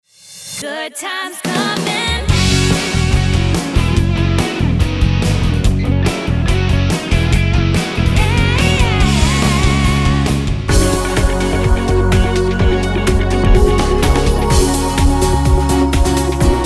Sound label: Upbeat Sunshine Celebrate